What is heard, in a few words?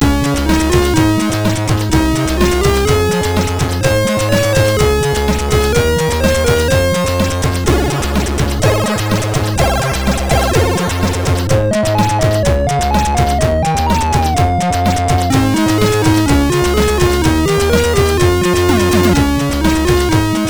Hymn, sid MOS6581R4; Magnetix